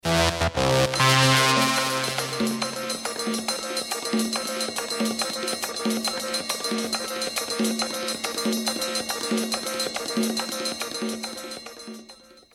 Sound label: news jingle early 70s